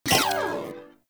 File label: Hack UI Negative; Warframe; The New War; Narmer Hack